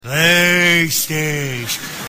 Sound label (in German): panzer